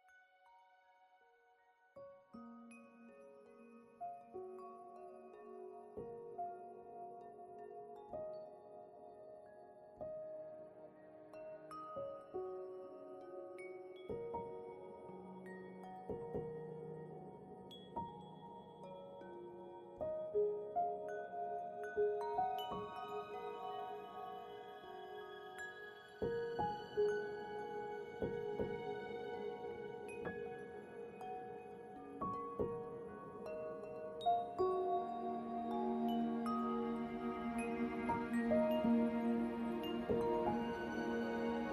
Quiet Reflections, AMBIENCE FOR SLEEP RELAXATION MUSIC